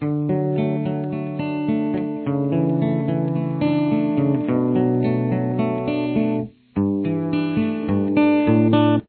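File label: theme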